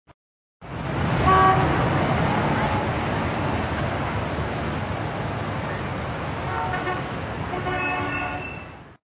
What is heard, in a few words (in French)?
ambiance rue